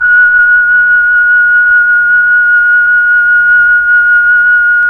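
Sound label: Mellotron Flute F#6; Tron Flutes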